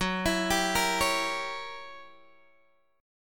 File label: Gb 7th Flat 9th Gb Guitar Open G